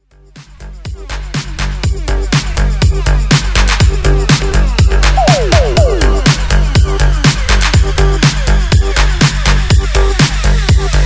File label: Bootleg 122BPM